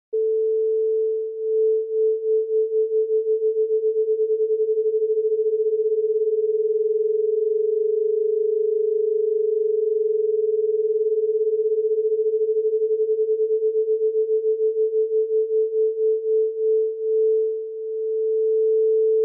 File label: Sine Pitch